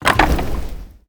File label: firewood throw